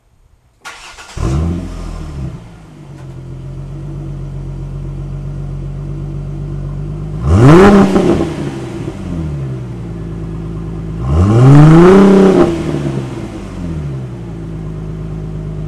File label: gt muffler, mustang